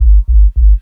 Smooth Sub, Jungle; Bass; Blu Mar Ten; Jungle - 1989 to 1999

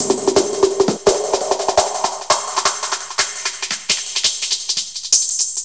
Shock Filter Break; Jungle; Breaks; Blu Mar Ten - Jungle Jungle - 1989 to 1999 Samplepack

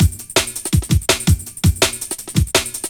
Trippin Break; Jungle; Breaks; Blu Mar Ten - Jungle Jungle - 1989 to 1999 Samplepack

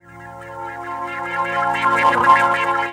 Fader; Jungle; FX; Blu Mar Ten - Jungle Jungle - 1989 to 1999 Samplepack

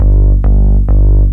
Sweet Bass - 6A; Jungle; Blu Mar Ten; Jungle - 1989 to 1999

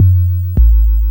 Physical Bass - 8A; Jungle; Blu Mar Ten; Jungle - 1989 to 1999

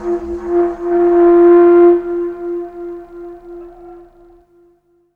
Flute - 4A; Jungle; FX; Blu Mar Ten - Jungle Jungle - 1989 to 1999 Samplepack

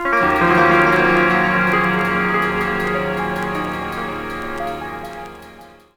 Piano - 2A, Jungle; Riffs, Arps & Hits; Blu Mar Ten - Jungle Jungle - 1989 to 1999 Samplepack

